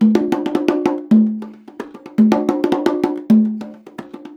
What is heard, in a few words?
110 CONGA, Percussion Loops; USB Soundscan vol.36; AKAI